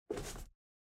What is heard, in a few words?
Cloth movment